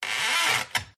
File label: Wood Stress Creak Squeaks; DPA4060; pmsfx